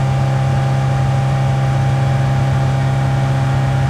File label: Jeep Wrangler Loop; Trucks